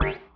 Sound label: ui yellow button